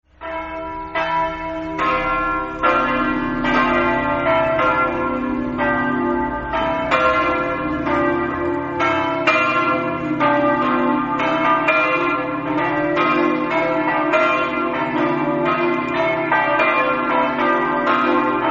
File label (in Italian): campane